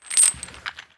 troll chain

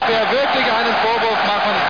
zap; packard bel

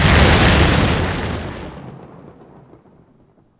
BLAST; weapons; baton; Shadow Man

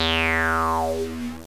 yamaha cs-5, synths; Zoom - Release 2 (1996); Active Software

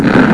Collision; Arcade; Shoot; Alpha Battle II 2.0

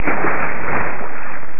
splash!; charley cat mouster mind

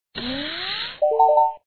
sound Refuel; rescue lander

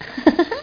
giggly

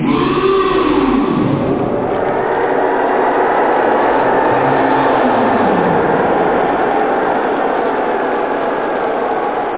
oooh; da capo vol1; instruments

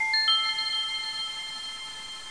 Sound correct

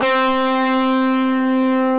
Electric Guitar; Eartraining 2.3

